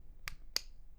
Flashlight; HapticSamples; Objects; Feel; NiceVibrations

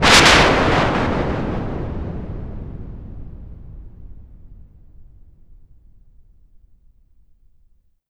Thunder; Feel; Feel Demos; Barbarians

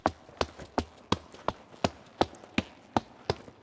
run; High Ground Royale Netcode

